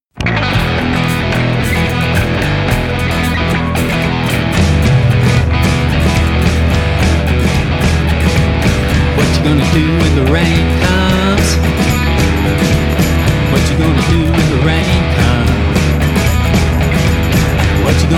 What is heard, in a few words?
Rain Comes